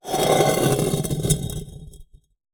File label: Drag, Vase